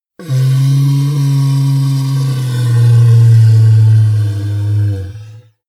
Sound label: monster inhale low; kreaturen